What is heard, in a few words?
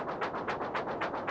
TRAIN; Rubber Chicken Software Sample Bank; TS-10 2